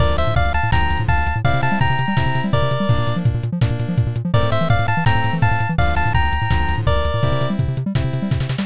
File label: bgm